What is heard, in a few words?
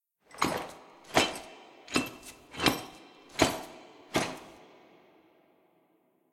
walk normal